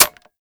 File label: Magnum Mech; Warframe; Tenno